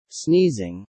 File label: sneezing